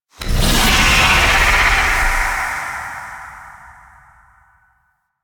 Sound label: Vocal Shriek C; Enemies; Warframe; The New War; Archon; Nira